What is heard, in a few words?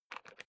briefcase plastic latch lock key turn